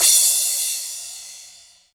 Crash, EDM; Sonic Foundry (Sony Creative Software); Afterhours EDM; Retro Active

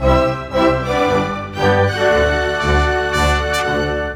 Orchestra, Rock Pop; Sonic Foundry (Sony Creative Software); Orchestral Series VOL-4; Rock and Pop; Rock-Pop 03

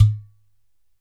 GHATAM

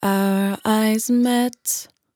100bpm Bm, Velvet Vocal; Zero-G

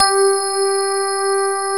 GRAND PF G 3, AKAI S-Series

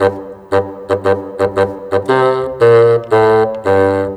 Bassoon, Orchestral, Rock Pop

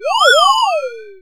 BOGEYMAN, Anime Sound FX; COMIC

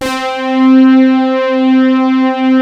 KORG WAVESTATION; ANALOG LUV TNG; Rubber Chicken Software Sample Bank

